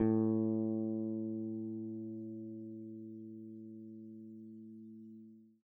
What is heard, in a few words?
a3 open p, swagbass